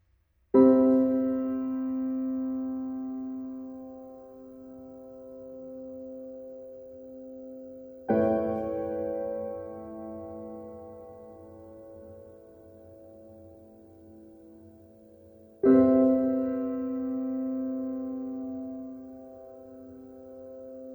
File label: Piano Miniatures